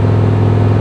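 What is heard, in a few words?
Engines low loop; SuperBike2001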